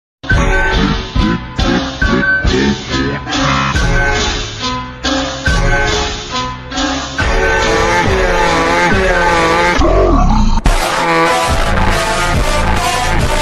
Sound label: beeper funk montagem vozes profundas ultra slowed reverb